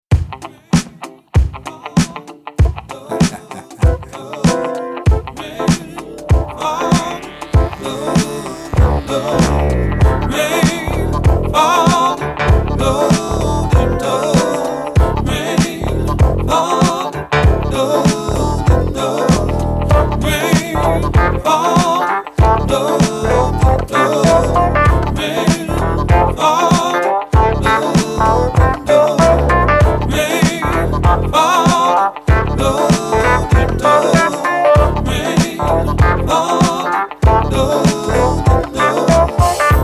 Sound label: Remix